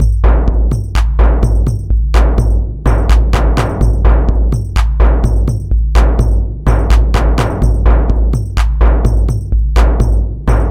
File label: stomp